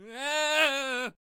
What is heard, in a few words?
Brawler Death Vocals